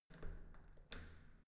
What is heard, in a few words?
entrance door handle use